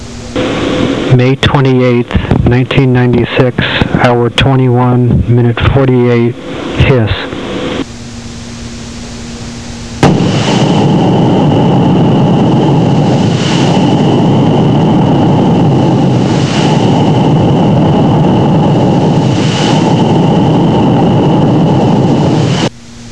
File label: hiss